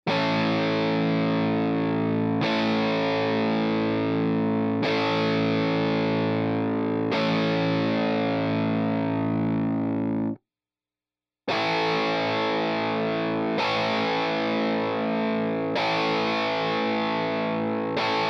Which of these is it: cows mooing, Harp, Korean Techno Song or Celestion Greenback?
Celestion Greenback